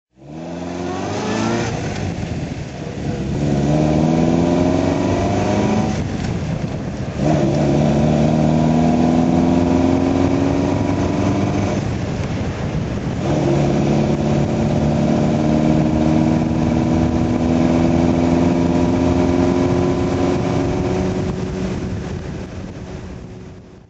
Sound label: mg tf 1250 drive